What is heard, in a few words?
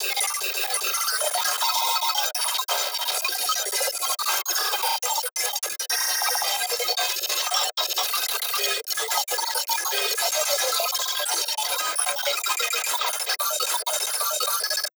Glitch Stuttery; Jino